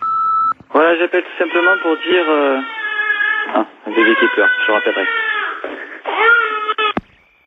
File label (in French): Bebe qui pleure repondeur